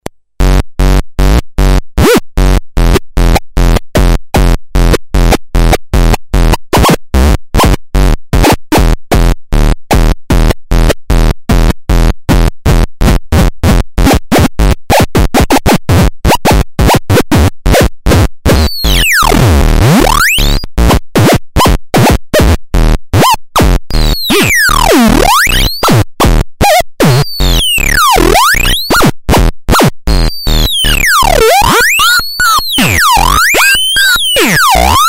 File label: OPTICAL THEREMIN